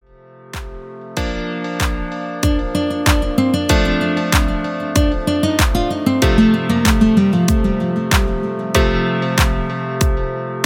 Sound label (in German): musik hoerprobe